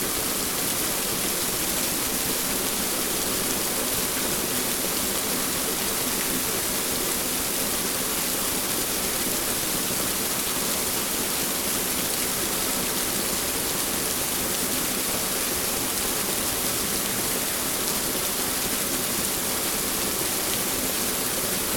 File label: waterfall; soundscapes; transfagarasan balea